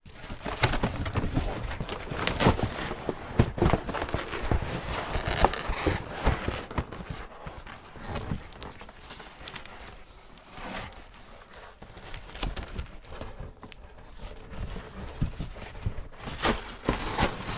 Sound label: cardboard box rip tear demolish long; foley